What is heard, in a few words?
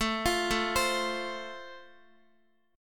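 A Minor Sharp 5th, Guitar, chord strum; Am#5 arpeggiated; x,x,9,10,9,8; JGuitar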